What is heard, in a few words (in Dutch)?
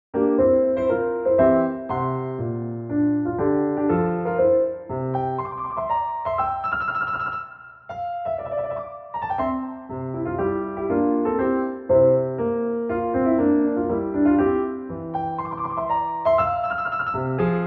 piano begeleiding 120bpm